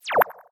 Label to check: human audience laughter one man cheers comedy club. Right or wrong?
Wrong